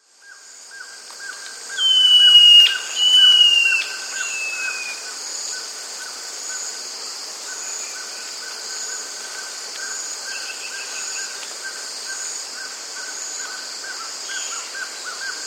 Pale-Faced Antbird, S. America

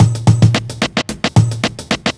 block rock kit

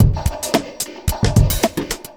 evil beats 110

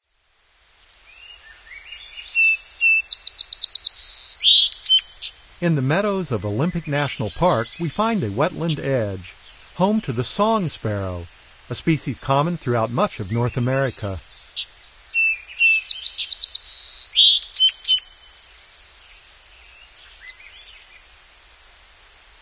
Song Sparrow; Know Your Bird Sounds

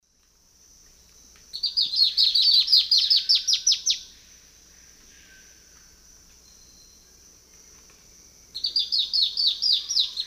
Myrmeciza atrothorax, duet; S. America; Eastern Lowlands

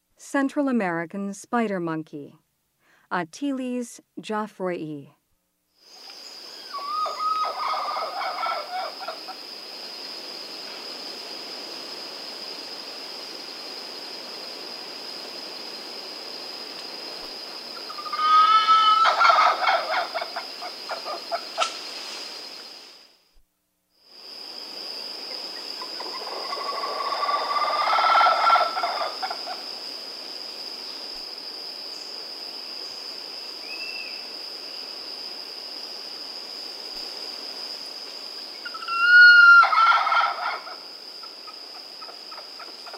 Central American spider monkey; Rainforest

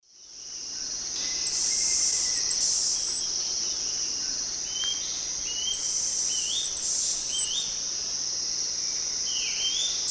Tangara nigrocincta, alarm calls; S. America; Eastern Lowlands

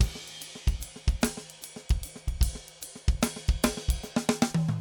funk 6 4 150; odd loops

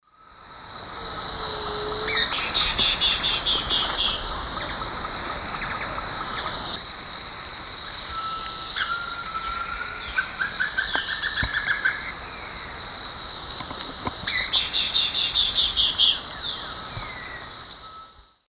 Myiopagis flavivertex, call; S. America; Eastern Lowlands

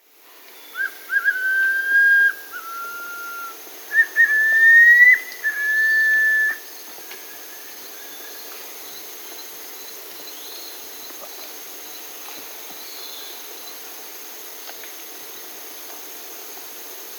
Striolated Puffbird (Nystalus striolatus), Peru; Birds of the Amazon; S. America

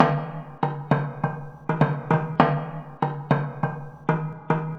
Caved Beat; Electronica; Solo Beats

